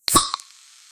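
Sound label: a soda candy energy swoosh, beverage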